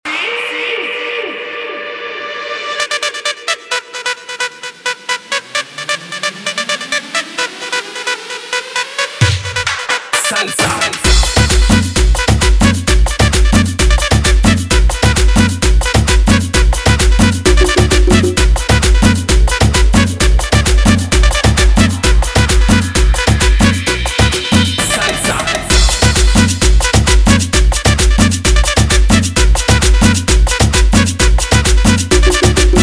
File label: salsa guaracha remix 131 bpm; Reggaeton dj remixes